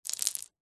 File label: foley stones small handful set down on tiled floor